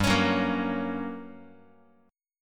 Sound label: G Minor Major 7th Flat 5th G, Guitar, 4A, 4E, 5A, 5D, 5G sharp, 6C sharp; strummed; x,3,4,4,2,x